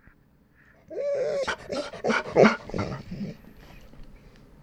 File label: growl